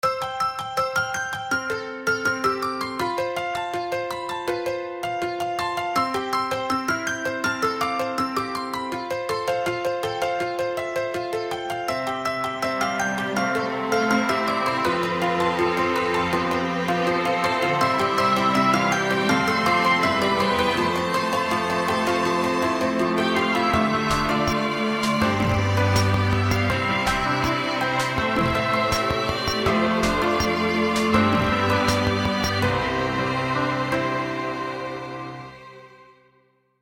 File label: sparkling melody; Apple